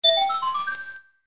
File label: 1 up; Звуки из игры Марио